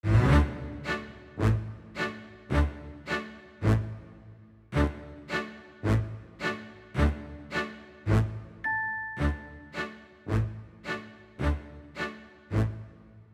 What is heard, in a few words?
Telephone BGM Entrance